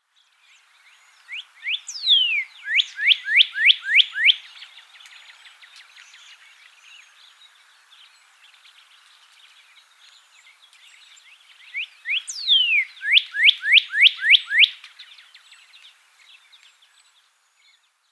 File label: Northern Cardinal Cardinalis cardinalis